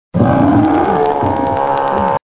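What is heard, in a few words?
Gorilla Sounds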